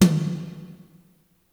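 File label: pop toms, drum kit; Giga Samples Collection; ELQ-DrumQit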